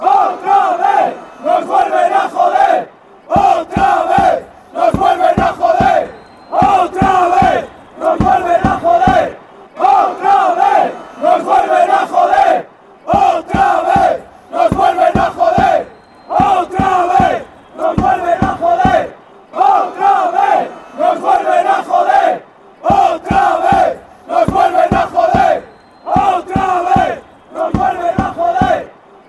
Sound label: again, fan chants